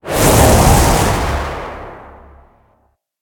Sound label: spin; smite; chaac